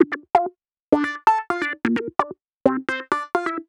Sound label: Moog Passes A 130 D, modular 130bpm; modular madness